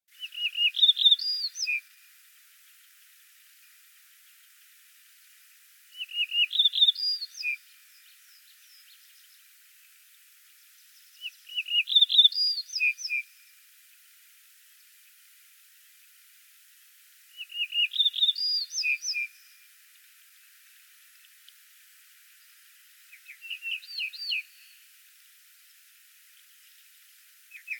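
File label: yellow-breasted bunting voice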